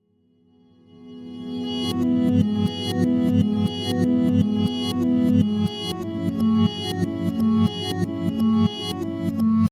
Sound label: rev guitar